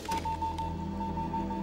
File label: Sound Of The Drums; escape room rhythm